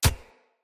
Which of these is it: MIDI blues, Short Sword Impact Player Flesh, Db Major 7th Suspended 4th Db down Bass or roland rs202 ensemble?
Short Sword Impact Player Flesh